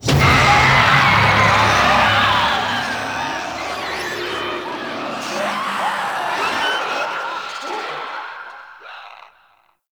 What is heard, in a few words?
Zoo Wave Start Stinger; Warframe; NineteenNinetyNine; Stage10TheZoo